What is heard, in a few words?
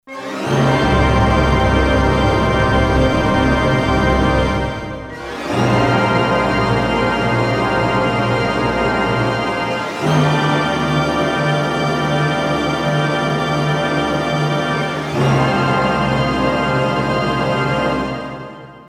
Orchester Auftakt Jingle G; Jingles for films podcasts